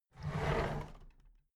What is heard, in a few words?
drawer big clatter open heavy